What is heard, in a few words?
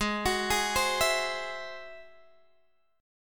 G sharp Augmented 7th G sharp Guitar Open G